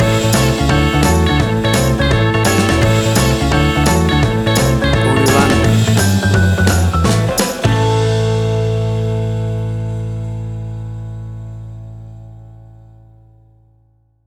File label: 60s Bounce Pop